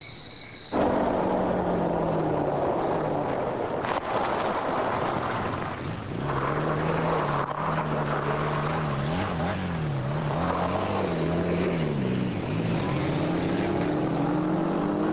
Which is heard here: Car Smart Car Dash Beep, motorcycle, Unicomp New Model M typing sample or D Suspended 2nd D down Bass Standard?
motorcycle